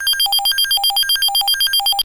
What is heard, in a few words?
Nextel Ringer